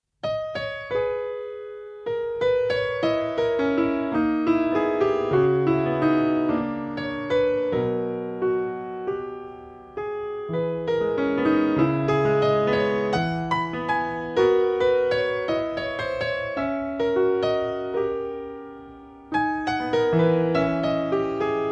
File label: Pieces For Piano; No. 2, Caminos del Espejo